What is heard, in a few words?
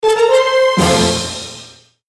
Crafting